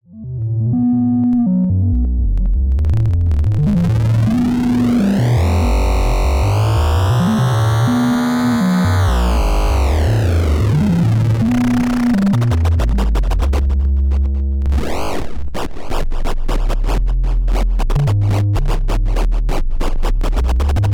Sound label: Erica Synths Liquid Sky Dada Noise System 2 Joystick Sine Mode LFO; Black Code Soorce